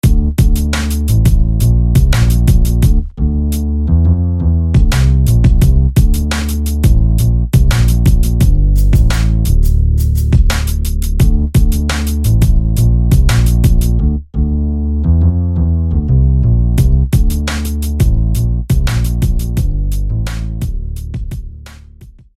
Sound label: Bassline; SFB FX